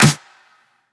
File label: Claps & Snares